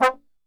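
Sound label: C 2 trombone shot, Brass; Best Service; Brass Super Section; TRMB SHOT; 90 s Sample CDs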